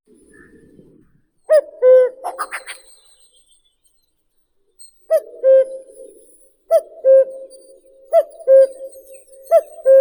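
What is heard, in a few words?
common cuckoo, Cuculus canorus; kukushka obiknovennaya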